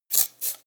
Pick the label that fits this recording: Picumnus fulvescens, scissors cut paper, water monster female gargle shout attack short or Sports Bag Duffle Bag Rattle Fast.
scissors cut paper